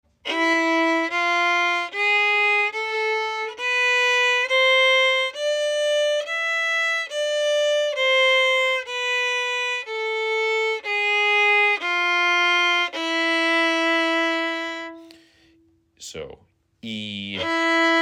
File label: scale for zemer atik